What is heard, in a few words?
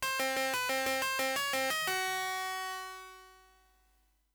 ditty; mmrnmhrm